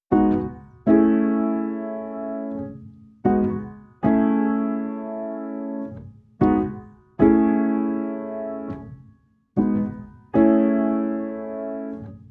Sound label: 76 BPM E Maj Keys; Lofi; Melodies; Loop Stems; Cymatics; Lofi Starter Pack; Mountains